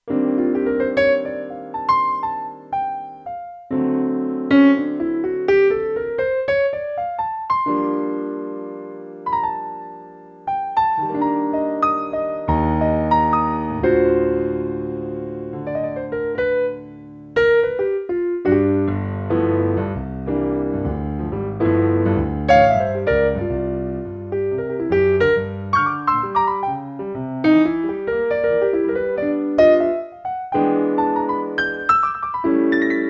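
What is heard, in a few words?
Stereo Solo Piano, Kurzweil K2500X; Factory Programs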